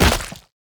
heroes veggiefy death; stregi

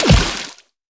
enemy elemental ranged impact

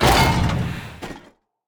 enemy animated armor revive; sfx

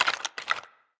dry bones break; sfx

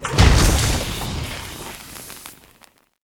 lava Smash; dwaarp

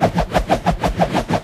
pole spin loop

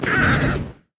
dragons enemy lava basic evolution; sfx

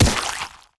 bee hit floor impact; crocs

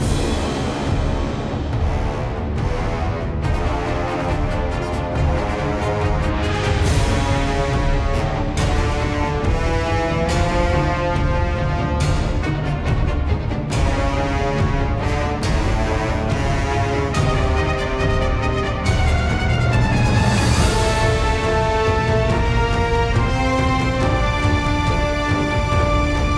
bg music boss

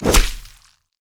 arterial Strike; templar

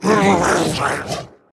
heehaw call

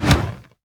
basic Attack cast; heroes kratoa

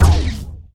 blazefang attack

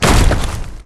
elemental death

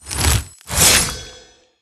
arm blade; durax

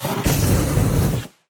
tower dwarven Flamespitter basic Attack cast

